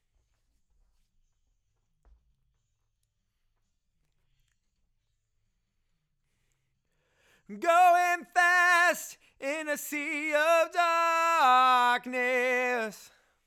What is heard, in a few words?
LV Choru